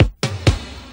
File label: Fill Ins 128BPM; Vengeance Samples; VEH2 Loops